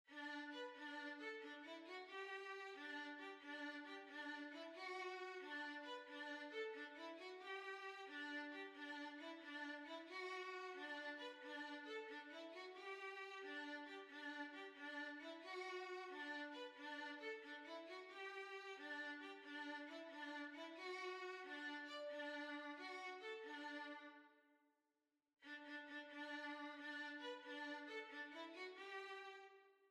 Violin

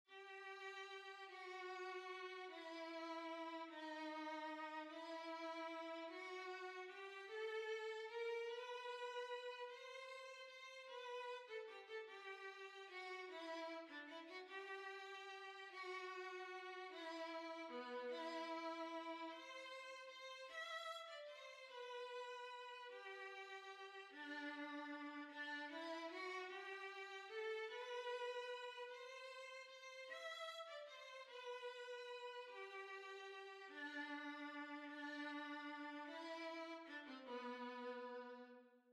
Waltz, Violin

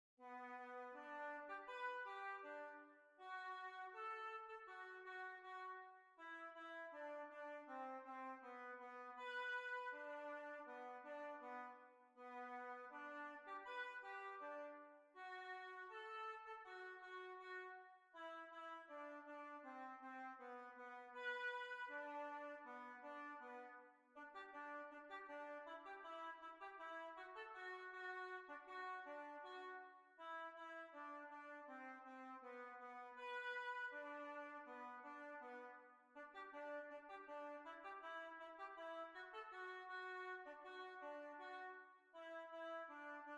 Hornpipe

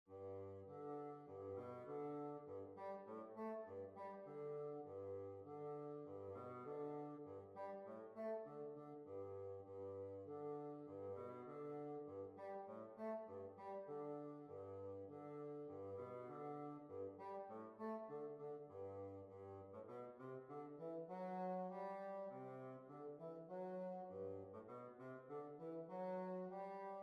German Spa

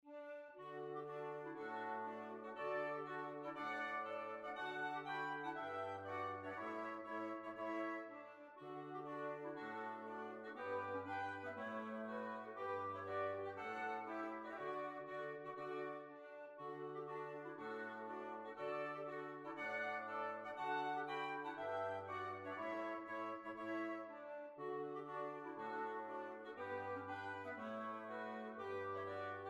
March

